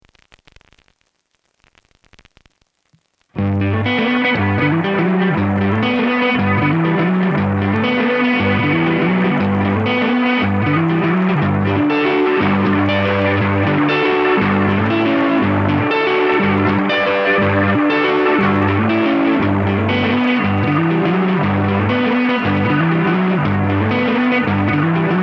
lo fi

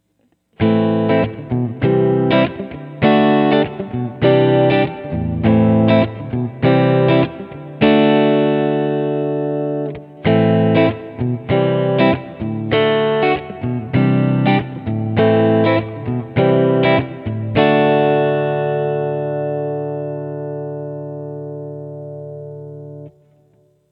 plate, bigsky; reverb; Folktale

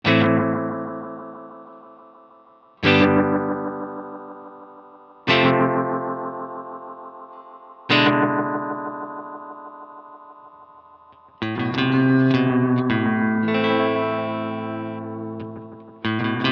High Repeats No Mod

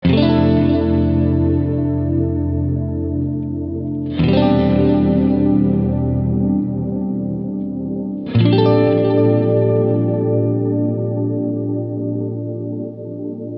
12bit

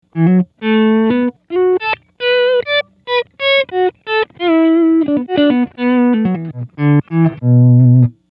autoswell rise; mobius

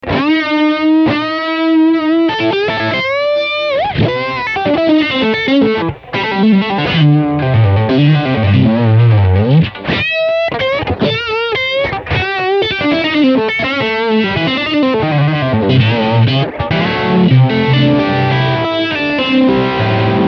vibe; mobius

